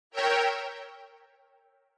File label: Parody Accent Low